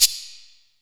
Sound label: Shaker